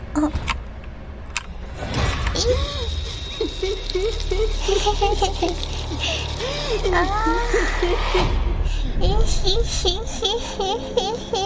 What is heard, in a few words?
cute laugh